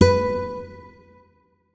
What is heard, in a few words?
notes, guitar; Hideout Furniture